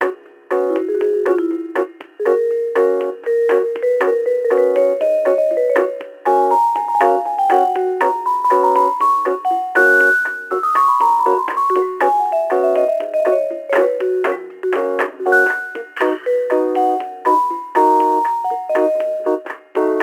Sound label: Elevator Music